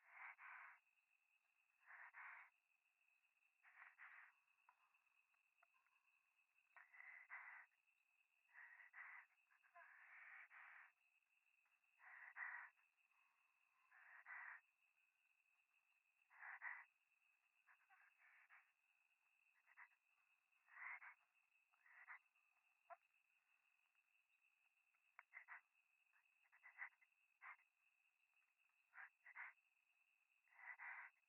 左耳 密着 吐息 山奥 夜

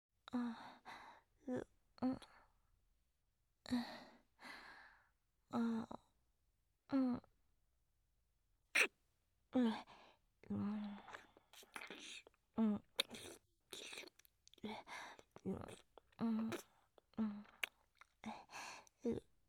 フェラチオ; SEなし

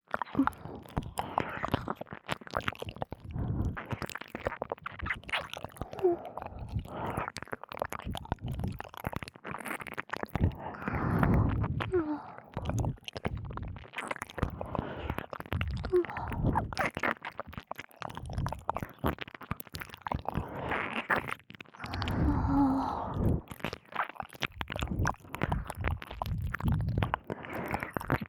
両耳舐めループ

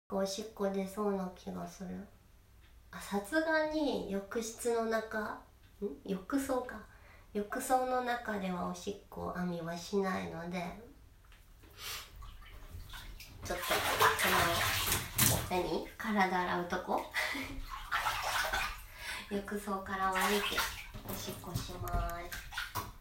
浴室でおしっこ

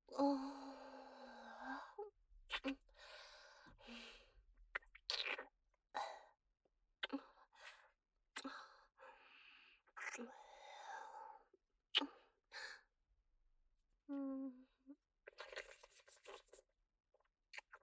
フェラ, ASMR; アドリブ集

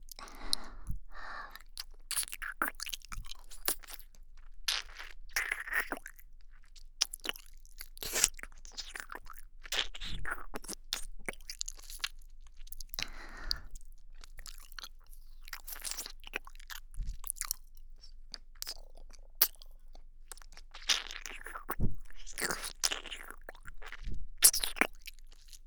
ちゅぱちゅぱ

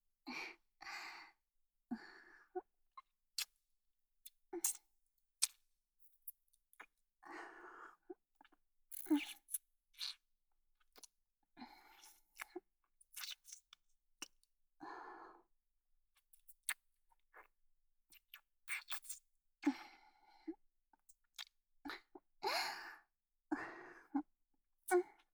キス; SEなし

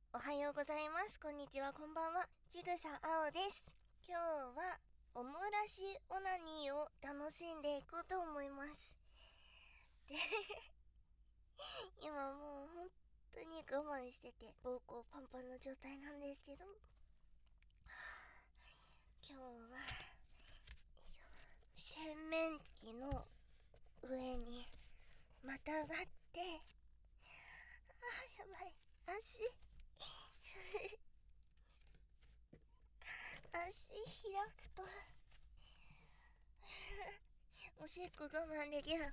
おしっこ我慢 からの、おもらしオナニー 吸引バイブでクリ攻めオマ●コ攻め バイノーラルマイク収録

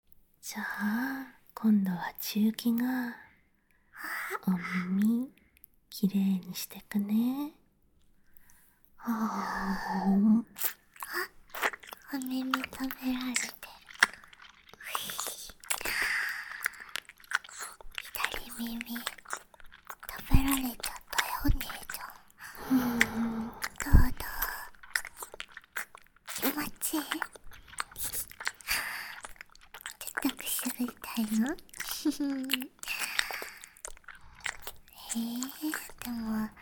耳舐め

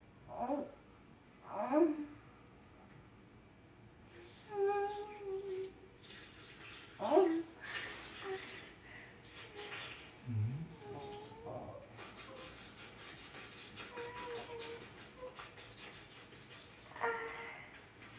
クンニ; クンニ2

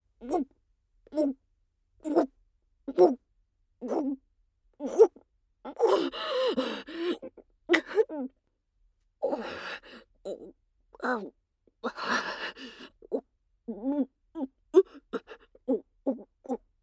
オホ声喘ぎキス, ASMR

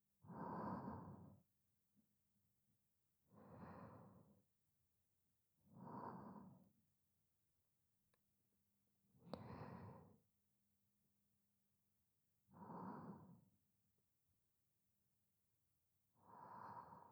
寝息; おまけループ音声

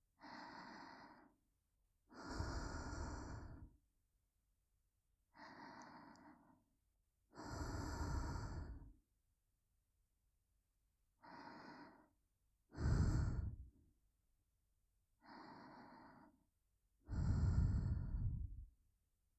右耳吐息のみ

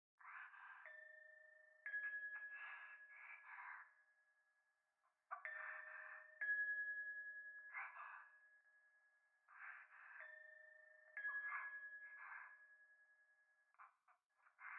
風呂 左耳密着処女喘ぎ 神社

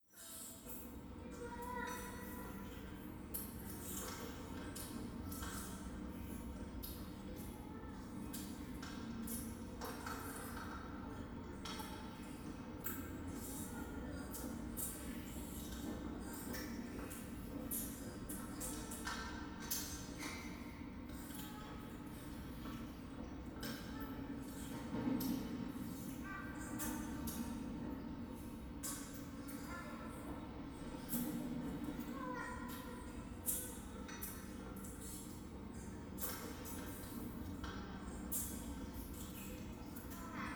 トイレ イラマチオ 電車

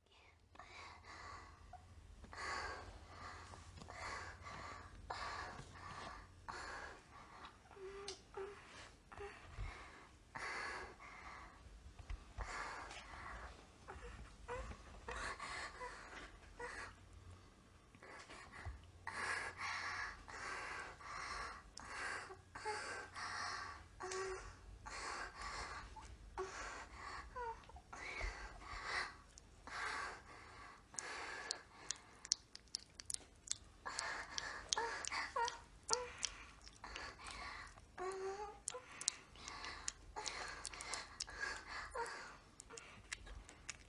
美少女のエッチボイス; マンコ調教で初めてオーガズムを体験する美少女のエッチボイス

